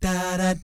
"DAH DAT", vocal jazz fx

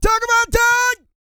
SING

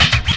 SCRAT; E-Lab; X Static Goldmine 2; akai

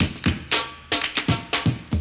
BREAK

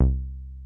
TB303

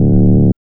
BASS

